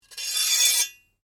Blade Scrape Slow, Sword; Foley sounds for melee weapons; Swords; Combat

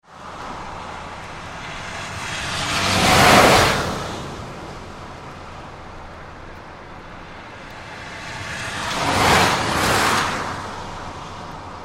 Traffic Passing Fast on Wet Road Close; Traffic and Cars; Tom Hutchings

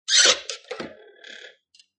Domestic Door Squeak; Creaky Doors (Sound Effects:Doors)

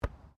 RUGBY BALL HANDLE; Various rugby sounds; Miscellaneous Sports (Sound Effects:Sports)

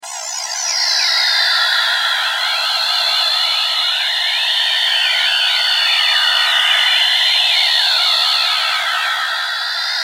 Transmission, Burst, Long, Science Fiction, 5.1; Streams

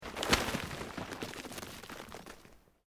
Earth Loose Move Short

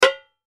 Impact Metal Hit Small Can Bucket; Sound Effects:Materials; Blastwave

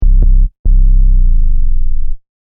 Techno Trance Sub Bass Loop In C 142 Bpm

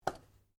RIFLE REMMINGTON700 7.62MM SCOPE COVER FORE OPEN; A selection of domesttic firearms; Rifles

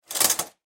Household Shower Curtain Close Fast; Bathroom

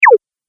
Space Future Communication Sound, sci fi

